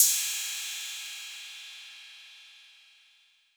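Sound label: 808 Cymbal; Roland MV8800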